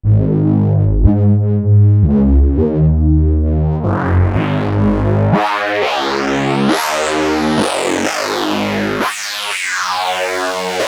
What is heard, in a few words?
filter hpf; Voici un son standard passé dans un des filtres passe-bas, puis dans un passe-haut; arturia origin